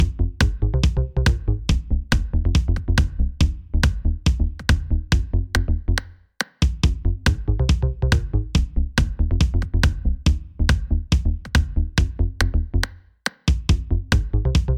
Solo

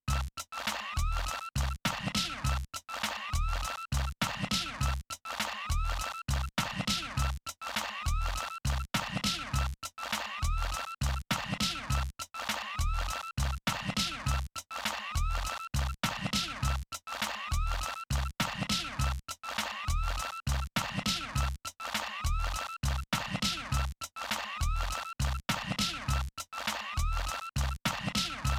Korg Volca Sample2

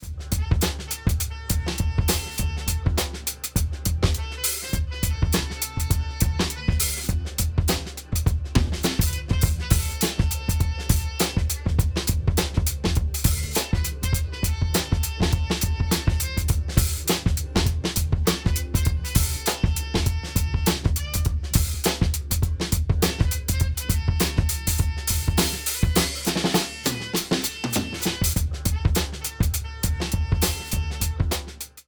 Ableton Drum Buss Beefy Drums

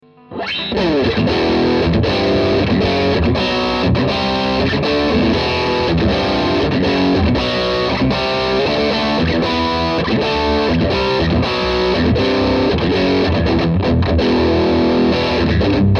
Modern Gain Strat

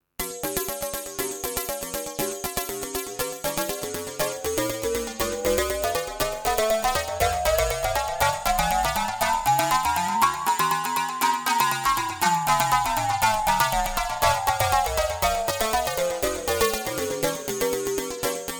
V-Synth Frequency Shifter

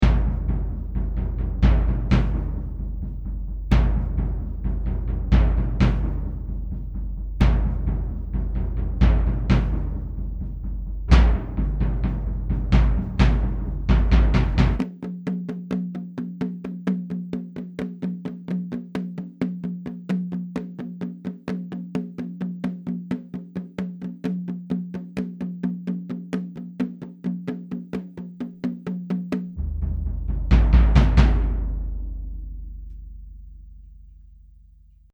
Epic

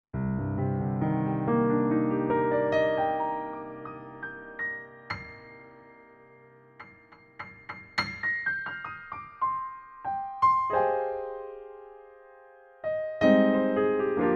PC3-A8 Concert Piano

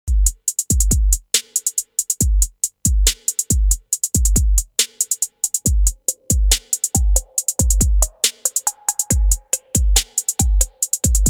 Trap Ping LPF Reso; electribe

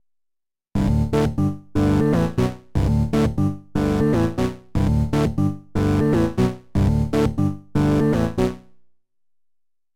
Studio Electronics Quadnic Chord Process CV

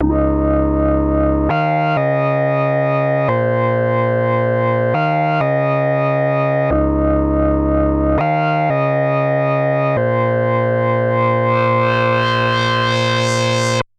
DSI Mopho Keyboard Melody

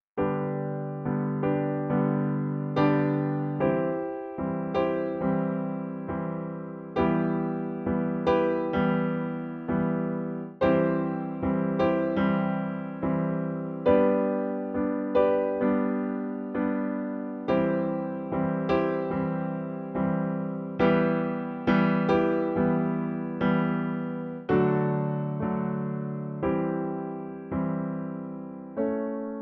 Tegeler VariComp4 SSL SiX No comp

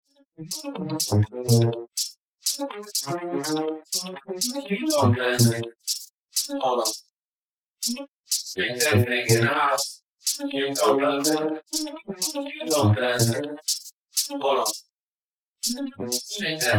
Vocals

